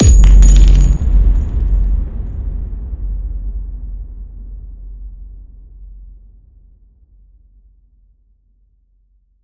Direct Hit Shells Boom

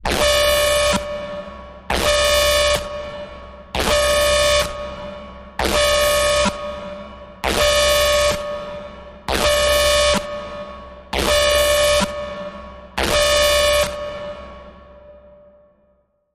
Total Lockdown Warning Pulse

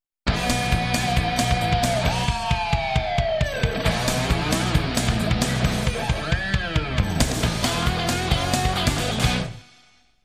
Guitar Bash

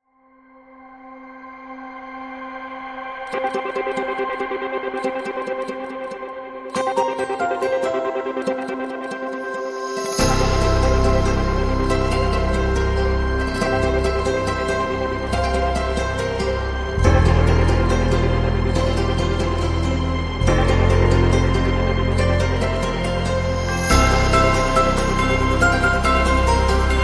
Light Percussion

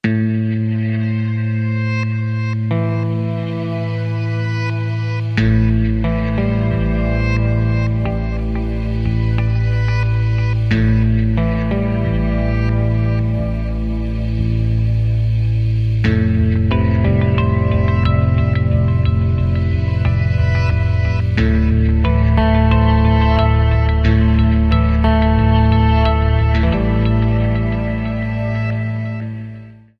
No Perc

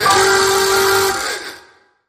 Zany Cartoon Eye Pop

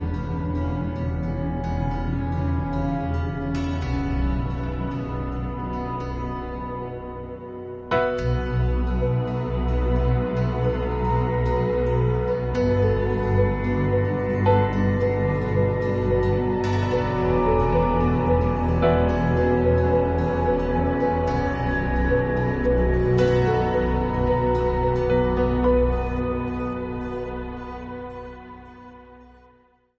No Perc

